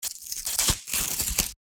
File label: SHORT CIRCUIT